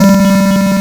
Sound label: NOTE G3, LSDJ; LIMAT LSDJ kit; LSDJ Notes; Drums